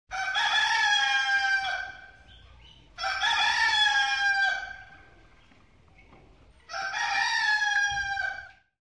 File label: Rooster Crows Three Times; SOUND EFFECTS1